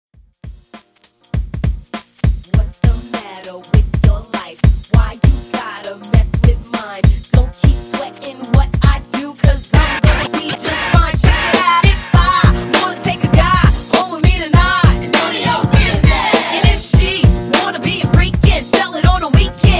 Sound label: Classic Redrum 100 Bpm